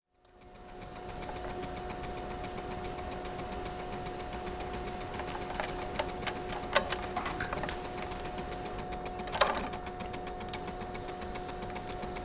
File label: trimming mugs on a pottery wheel